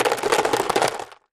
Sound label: Landscape Rock Plast, rocks & dirt